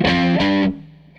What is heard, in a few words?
Tele Guitar Loop